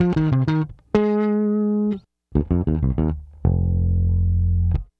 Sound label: Bass, 70s Analog Funk